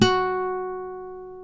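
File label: F#3 FLAMENCO, FLAMENCO guitar; WorldSounds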